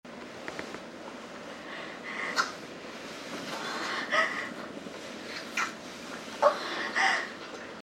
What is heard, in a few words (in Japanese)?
吐息